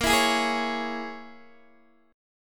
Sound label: A sharp Minor 7th Flat 5th A sharp, Guitar DADGAD